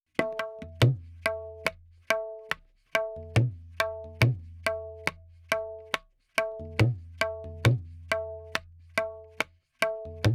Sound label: Tabla, 4-4, 70; Sample Banks; Percussion